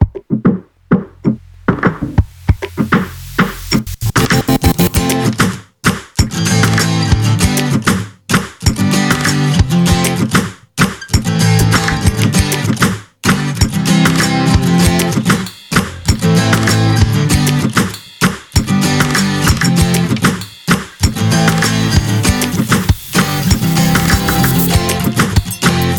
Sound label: Base Rap